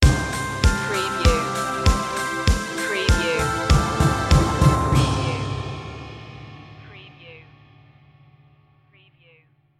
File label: scandinavian light stinger